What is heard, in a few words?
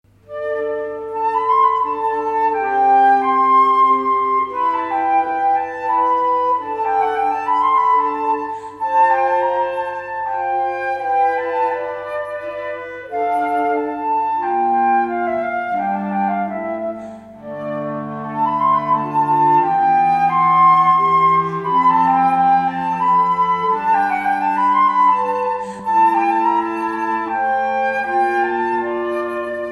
Minuetto; H.Tomasi - Concert Champetre